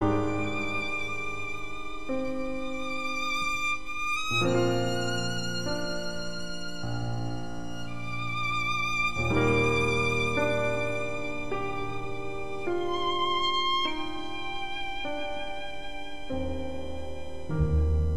Violin

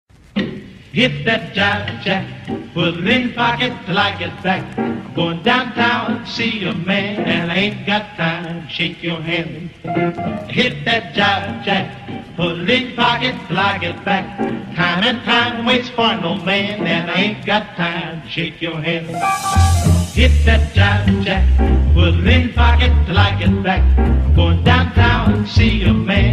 Trap

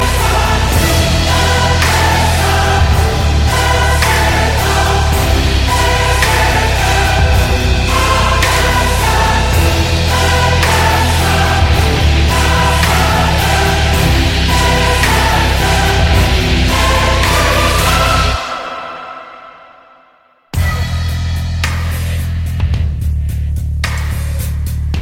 RNB

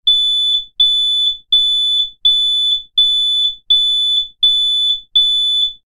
Smoke Alarm

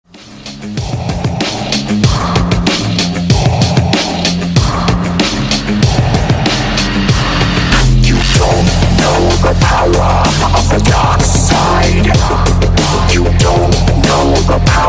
Imperial Dubstep

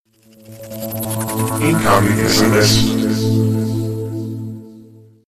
incoming sms; ringtones

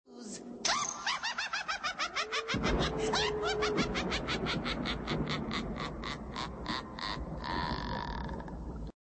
laugh

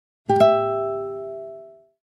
Two Tone Chime

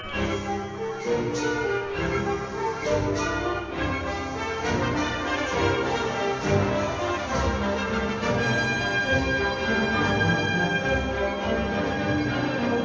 Acoustic

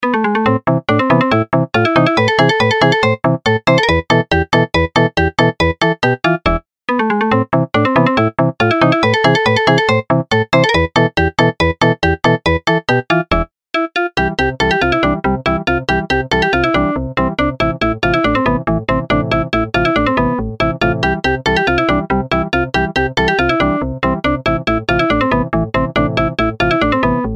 Classical Techno

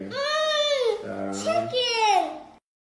Mmmmm

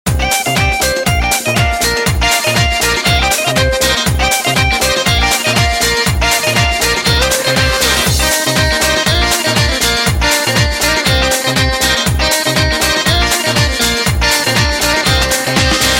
chechen Guitar

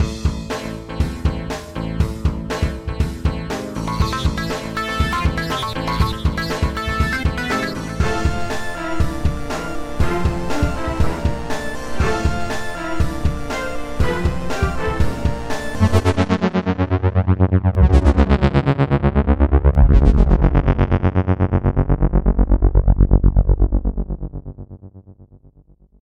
Soft sound

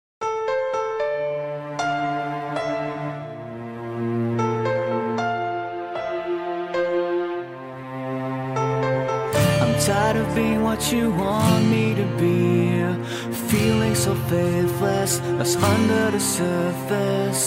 acoustic

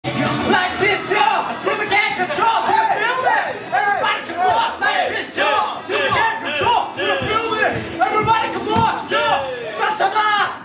Fan Chant

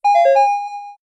found weapon; Zelda

